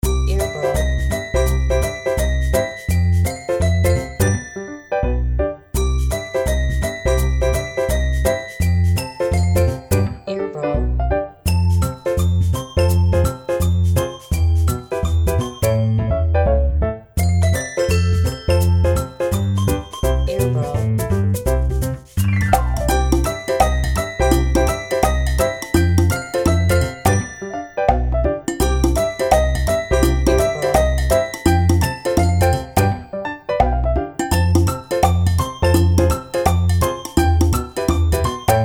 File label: Happy Day, Baby Bell